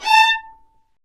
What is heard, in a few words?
String Sections, Violas Marcato; Roland LCDP13; STR Vas1 Marcato